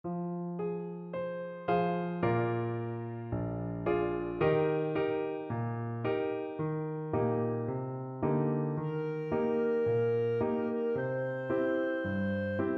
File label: fado clarinet